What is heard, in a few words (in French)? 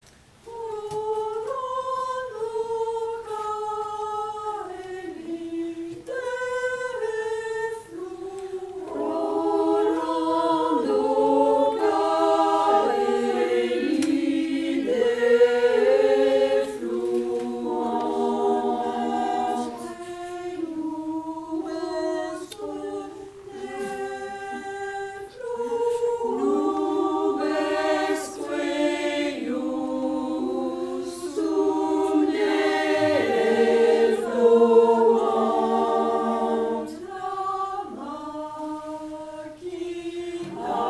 Sopranes, Alti et Mezzo; Rorando Caeli